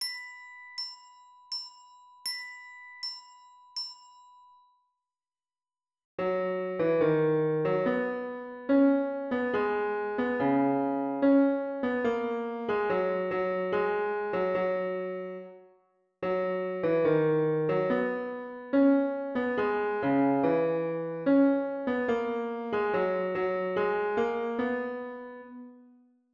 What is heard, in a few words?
Melodic Dictation